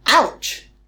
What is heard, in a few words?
ouch; dollsHouse